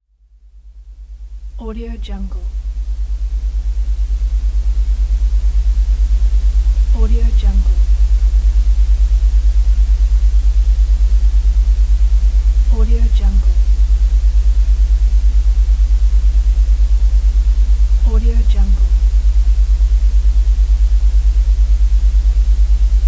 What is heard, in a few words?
white noise; lama sound